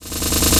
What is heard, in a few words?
Fill, Drum N Bass Percussion Fills n Breaks